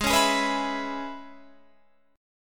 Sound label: Db Minor 7th Ab, chord